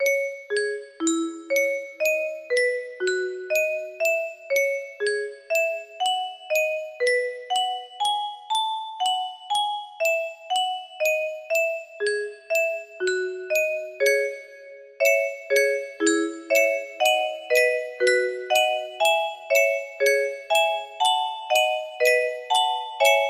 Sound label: melody, music box